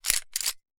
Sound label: ar slide